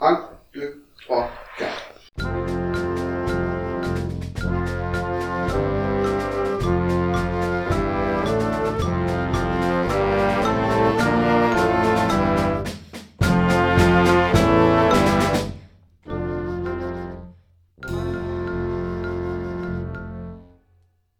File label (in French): on retourne aux instruments noire a 120